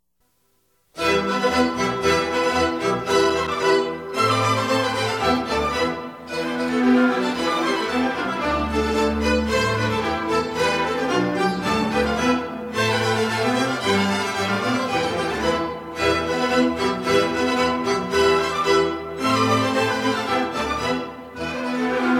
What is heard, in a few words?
orchestra only